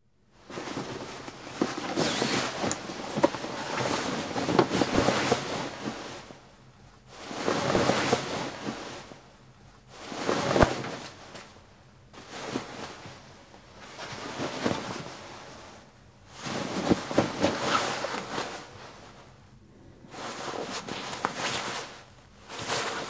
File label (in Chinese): 衣物(外套)沙沙响